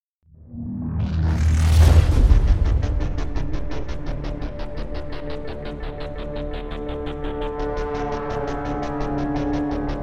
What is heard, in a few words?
no percussion